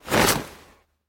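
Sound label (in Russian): быстро резко разгоревшееся пламя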